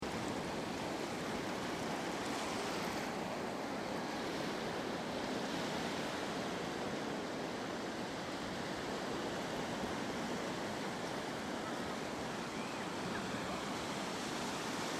Ocean Beach Sound Of The Sea With People In The Background; Ocean Sound Effects